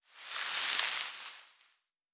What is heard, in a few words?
Foliage Foley; Survival Game Sounds - Lite Edition; Environment